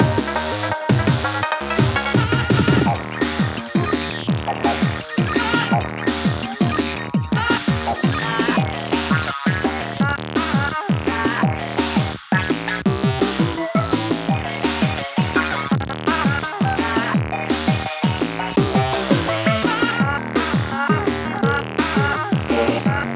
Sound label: Battle Beat; Splatoon 2; Octo Expansion